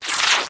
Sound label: Cloth Rip; Epidemic Sound; ROBLOX GAME ASSETS; Gameplay